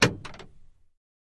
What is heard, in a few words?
Camaro Power Lock, chevy camaro